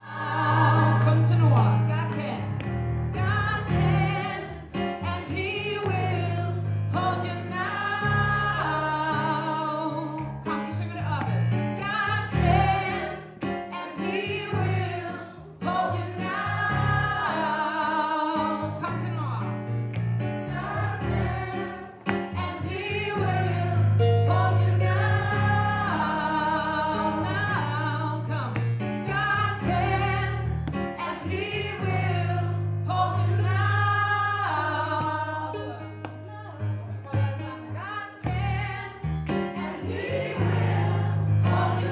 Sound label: finale tenor; kor